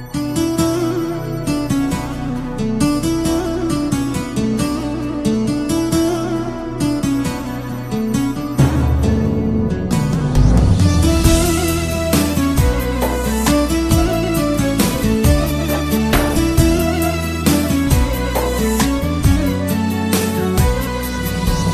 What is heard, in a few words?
guitar ringtones